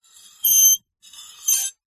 warfare knife tip scrape scratch metal screech piercing